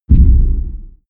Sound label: bass quiet bell impact